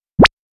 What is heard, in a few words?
pyoko